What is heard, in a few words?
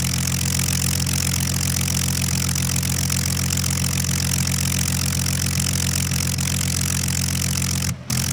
Plane P40 Idle Loop; Airplanes